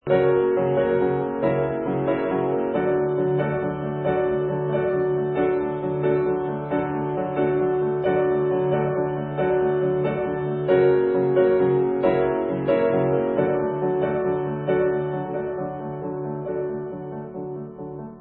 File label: Glass Piano Music